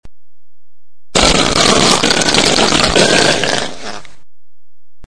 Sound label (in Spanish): flatulencia